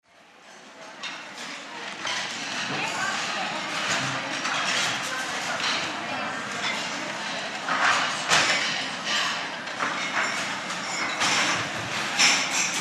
RESTAURANT Background CAFETERIA: Big noisy cafeteria, much clatter.; restaurants & cafes